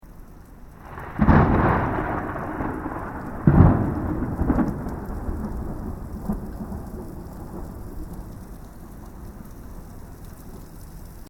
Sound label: THUNDER CLOSE RAIN STEREO; Elemental weather effects